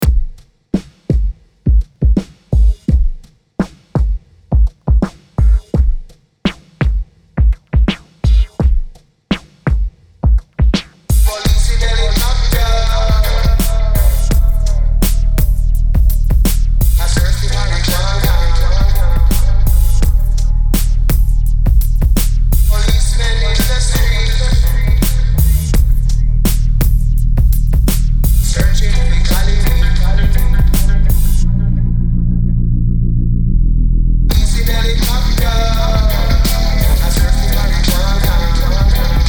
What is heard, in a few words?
Reggae Remix